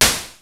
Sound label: JD-800 KIT